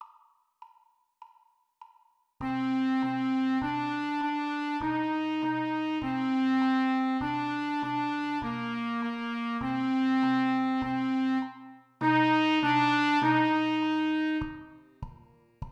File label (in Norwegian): marsj lyd 1 stemmer, skolekorps